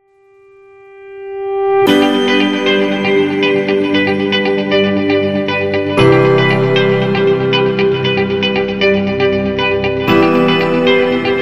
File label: Positive Dance, corporate music; corporate music vol 3